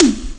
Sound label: tom drum; ST-XX Amiga Soundtracker Sample Packs